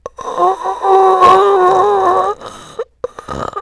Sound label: animatron breath; slender; fivenights common